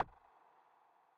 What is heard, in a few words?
click; gnome-control-center